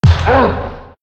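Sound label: giant wolf damage; monster; Senillinea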